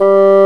Bassoon; CMI

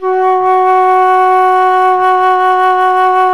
Flute; CMI

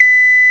OCARNA; CMI

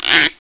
GUIRO; CMI

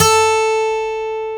Gtr Acc; CMI

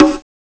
CONGA; CMI

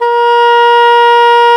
Cor anglais; CMI

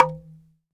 Bamboo E1; Shinachiku; CMI

